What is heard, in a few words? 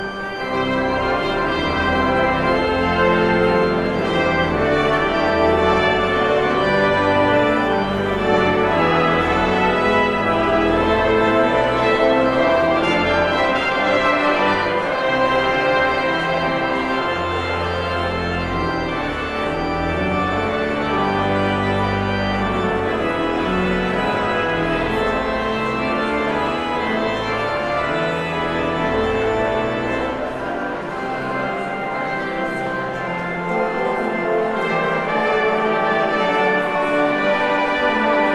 Postlude Fanfare